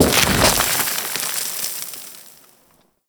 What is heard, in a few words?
Micro Burrow Exit; Warframe; Corpus; Arachnoid Micro; Abilities